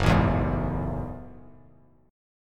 Gb Minor 9th, Bass Standard; strummed; JGuitar

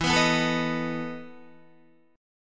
E Major 7th Suspended 4th, Guitar; strummed; JGuitar

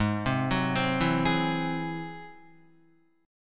Ab Augmented 7th Ab down Guitar; arpeggiated; Ab+7; JGuitar

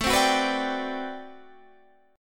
D 13th, guitar chord

